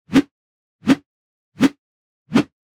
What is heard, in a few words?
simple whoosh versions; DeanKR; Graphical Essence